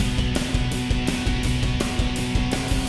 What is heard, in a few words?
Boss Fight Phase 2 MIXED; ben 10; Weatherhead Prime